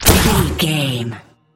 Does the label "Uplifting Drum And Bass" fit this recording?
No